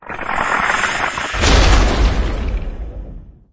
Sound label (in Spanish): caida